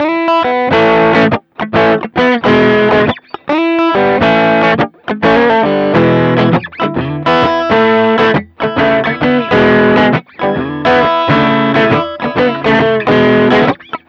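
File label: pedals overdrive; just pedals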